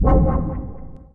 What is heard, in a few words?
woosh